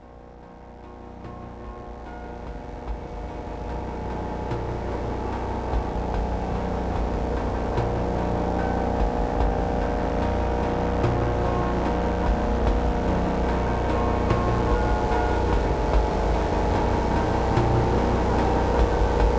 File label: teen age drone